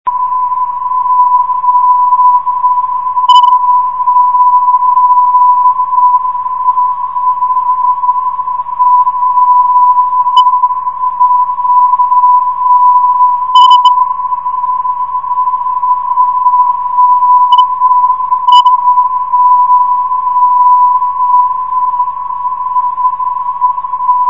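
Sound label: rp 322khz, non-directional beacon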